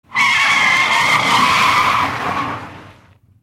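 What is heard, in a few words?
car drift sounds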